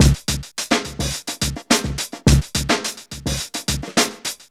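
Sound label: funky beat 106; Zero-G; Funky Elements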